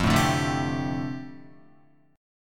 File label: F 6th Add 9th F down Guitar, chord; F6add9 strummed